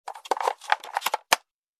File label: Cassette deck player portable insert tape